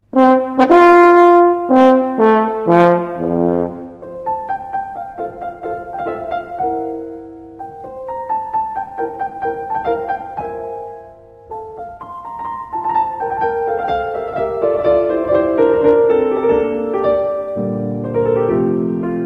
Horn Sonata

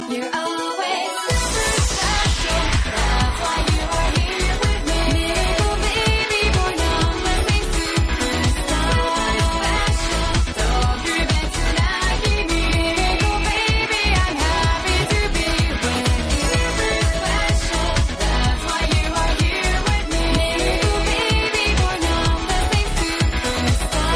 jazz chaos

